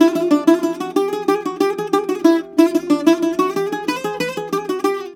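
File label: E, bouzouki; USB Soundscan vol.53; Mediteranean Traditions; AKAI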